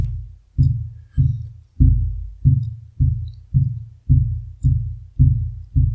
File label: Pulse